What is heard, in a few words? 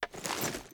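sniper reload empty fast raise; gmod; iw8; alpha50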